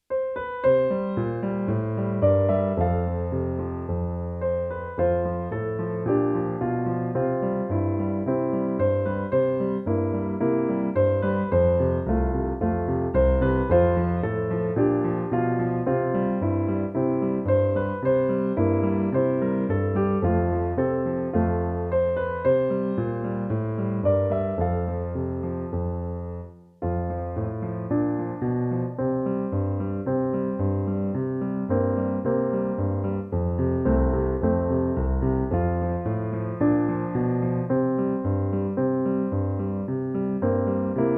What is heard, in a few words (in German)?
Peifermarsch in C